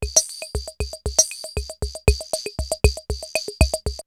CR78 R77 BeatBox, drumloops, DrumMachines; X-Static Goldmine

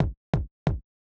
Beat - Kicks, 135bpm; Zero-G Sound Sense Grime Scene; GS Construction Kits; The Hoodrat; 90 s Sample CDs

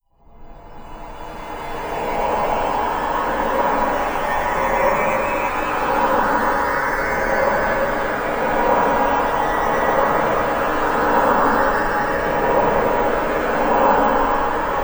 Noise Swell; Sonic Foundry (Sony Creative Software); Hydroponic Hip-Hop

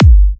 G, Kicks; markus hakala

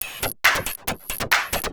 percussion loops, 138, Techno

